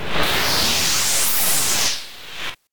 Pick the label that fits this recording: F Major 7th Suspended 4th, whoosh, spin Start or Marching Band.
whoosh